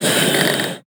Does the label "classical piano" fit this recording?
No